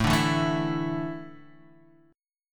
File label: A Suspended 4th, Guitar, Standard; Asus4; strummed; 5,5,2,2,5,x; JGuitar